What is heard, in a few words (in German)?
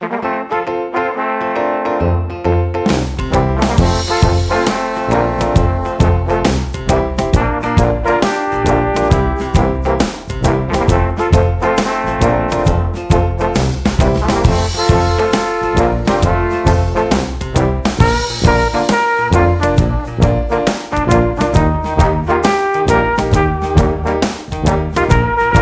Melodie; Kliniken Suedostbayern; Audiologo